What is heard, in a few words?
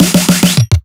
Riddim Drum Fill (140); Ghosthack; Riddim Essentials 2020